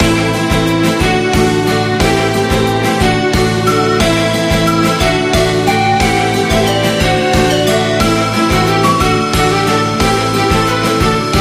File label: 80bpm